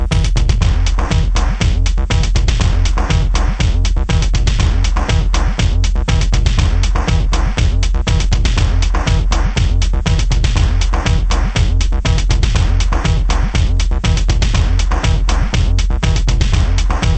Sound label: disco